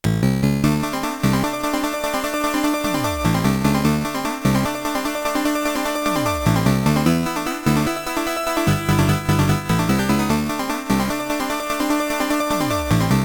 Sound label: Battle BGM; Yie Ar Kung-Fu 2 OST; SCC+ Stereo